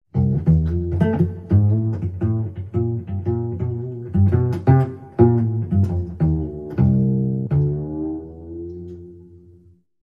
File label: Pizzicato Blues